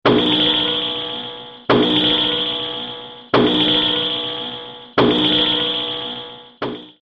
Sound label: Alarm From The Future